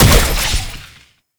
Pistol Projectile Explosion; Weapons; Warframe; Tenno; Temple Signature

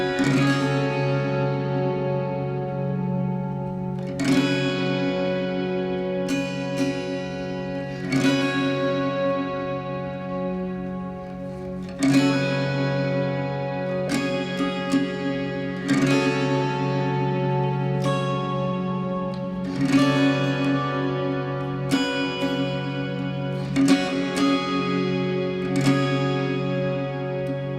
Menu Music Loop; UI; Boon; Warframe; Duviri

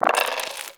Roll Dice; Cetus Vendors; Five Fates

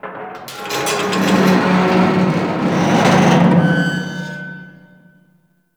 Metal Subway Car Heavy Creak; Warframe; Ambience; NineteenNinetyNine

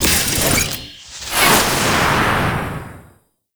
Archwing Exit; Archwing Deploy; Railjack; Warframe; Cinematics

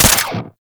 Pistol Mech; Warframe; Tenno; Temple Signature

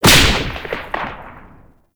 Whip Crack; Oraxia Whip

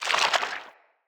Root Cave Mushroom Squish; Ambience; Props; Neathuns Root Cave

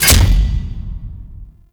attack impacts; Ambience; Gameplay; Warframe; The War Within

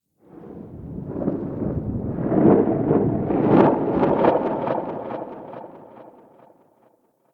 Ext Rumble, Ambience; RedNight

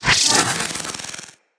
Spin Attack; Enemies; Abilities; Corpus; Arachnoid Micro

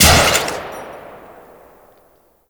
Mixdown Shot; Ember Prime Boss; Warframes

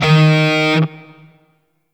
Grineer Shawzin Oct Two D Sharp; Warframe

